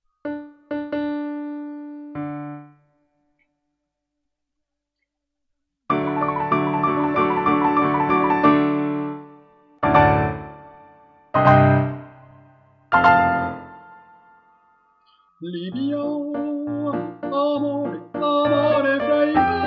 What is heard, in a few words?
piano; libiamo